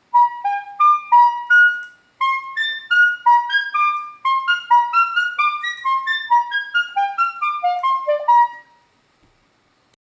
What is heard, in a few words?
Clarity Lick